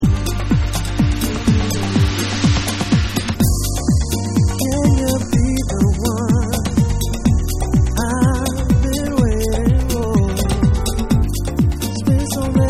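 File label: Alternate Vocal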